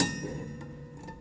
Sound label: Prepared Piano; Battery 3 Sample Kits